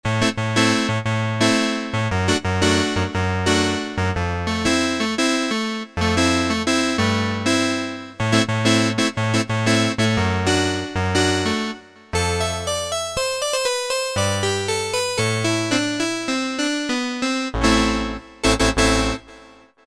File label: Clavinet, Cheetah MS6